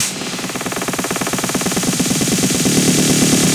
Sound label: Snare Roll; Sonic Foundry (Sony Creative Software); Afterhours EDM; Session 10 - Come In Hard